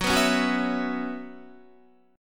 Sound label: Gb 7th Suspended 4th Gb down Guitar, chord